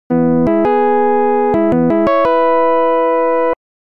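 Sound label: Clear Fanfare; the return of ishtar original soundtrack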